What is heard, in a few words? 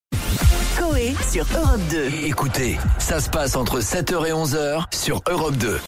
Jingle Podcast; Europe 2; Cauet